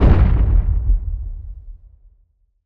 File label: flashbang explode distant; weapons; Counter Strike Model Pack; CS:GO